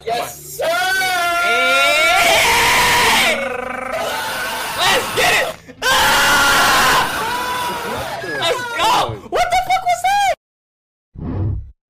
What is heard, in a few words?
yeah srrrrr eeeeeeeeeee